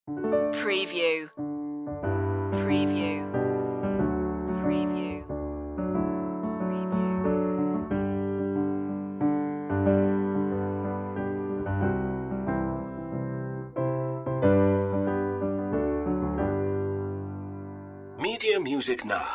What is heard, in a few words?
happy birthday to you piano gentle; Speciality / Novelty